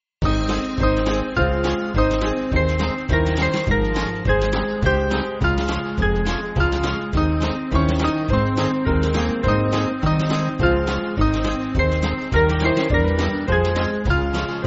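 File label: Band, Backing Files for Churches; Christ sits as God's right hand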